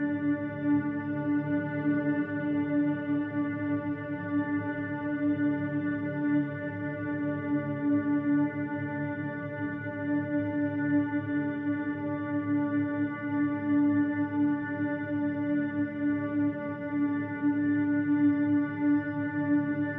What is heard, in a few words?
music temple drone; Amnesia: A Machine for Pigs